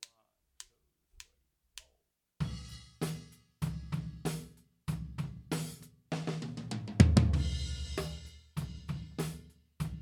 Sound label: comp F tom; Drum Recording Setup